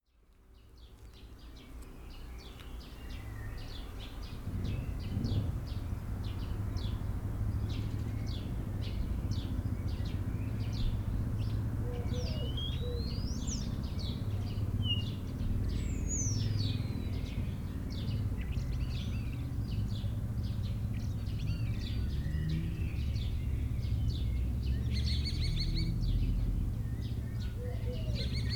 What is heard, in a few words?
ambience